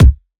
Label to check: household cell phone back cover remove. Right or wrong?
Wrong